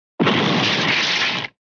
wood crunch

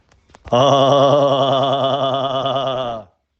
ha hahahahahaha

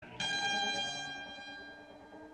dagger ting; macbeth

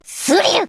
sneeze; necoarc

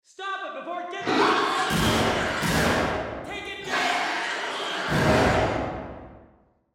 alien killing

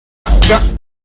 guh; tiktok sound effect

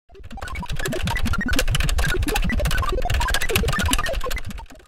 tech attack keyboard

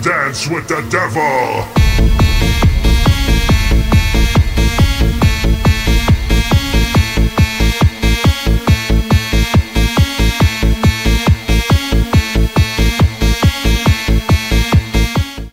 jingle

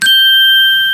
ding

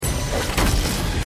energy blast

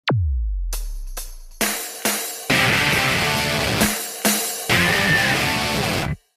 riff; hi-fi rush

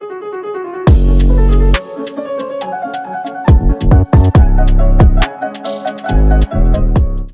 jingle

